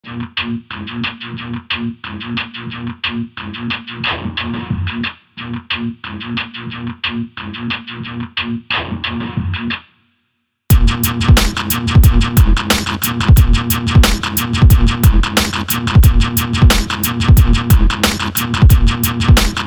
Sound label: Hardbeat